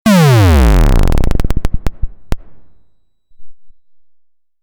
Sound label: Digital Alert Overload Downwards; Digital Clicks, Blips & Alerts